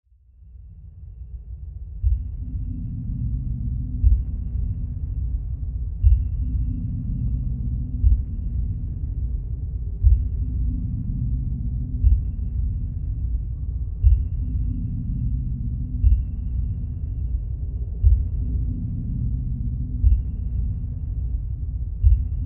science fiction deep space ambience drone airy spacious eerie with pulsing thuds; zapsplat